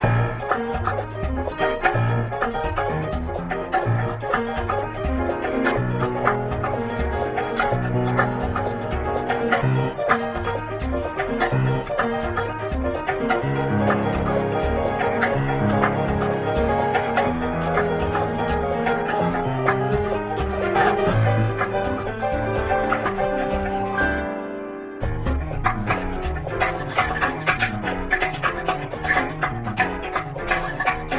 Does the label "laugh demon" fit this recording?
No